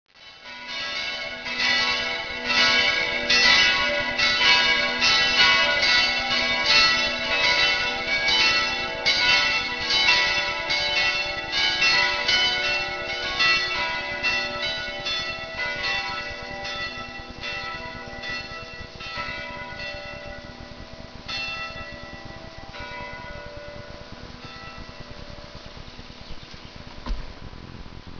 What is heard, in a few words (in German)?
glocken